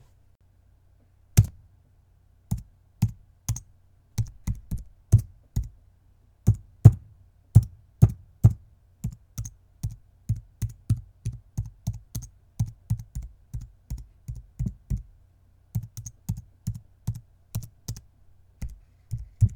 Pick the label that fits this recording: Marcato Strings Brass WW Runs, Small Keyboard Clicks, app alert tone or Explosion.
Small Keyboard Clicks